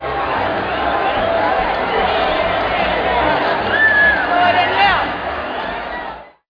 crowd

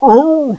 cry

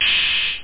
hihat; drums

